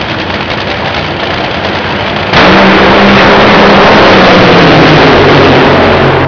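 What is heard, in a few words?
top fuel, drag racing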